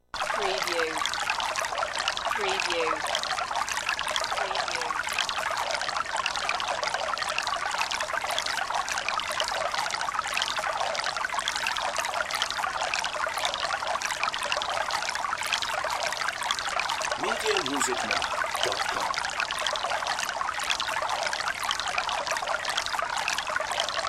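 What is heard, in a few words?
Stream Medium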